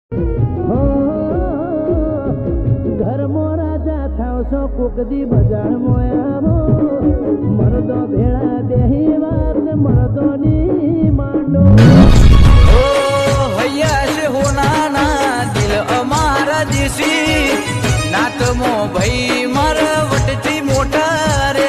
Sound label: Gujarati